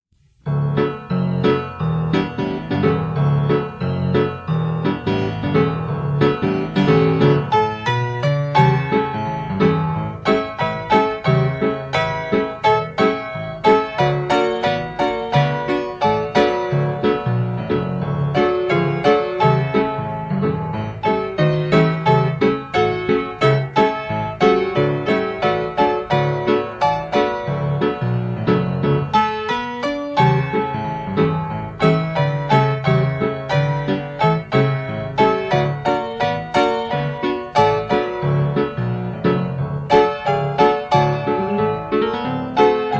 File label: Player Piano; Glen's Player Piano